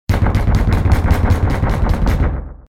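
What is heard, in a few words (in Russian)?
звук кулаком в деревянную дверь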